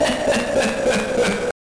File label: Laugh; Gunstar Heroes